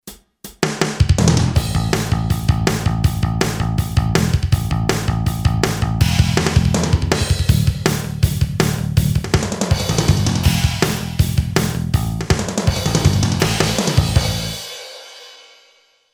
HARD ROCK, Boss DR-3

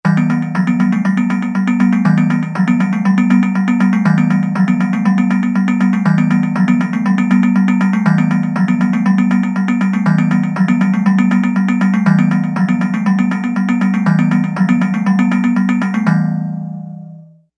Arpeggio

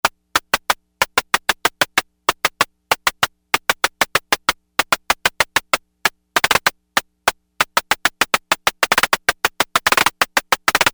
Hat

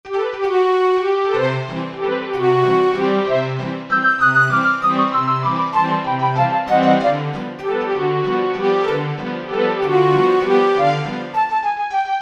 Pno Wnd Strg

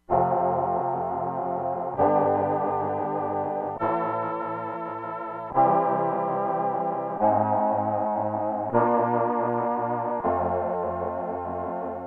MALE SPACE CHOIR, Crumar Bit 99; Factory Programs

